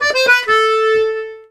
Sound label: accordion, Complete Accordions; USB Soundscan vol.40; AKAI